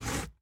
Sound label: Cupboard Slide Out; lethal company